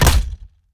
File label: bat